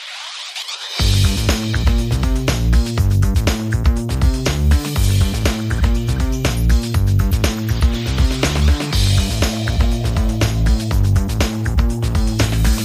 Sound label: Drums & Bass Only; 60sec